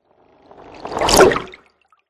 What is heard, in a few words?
Cinematic Water Whoosh